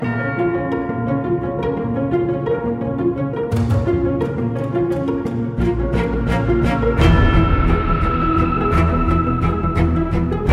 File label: music match intro 160bpm; classic main theme for archmother